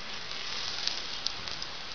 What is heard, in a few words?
sand fall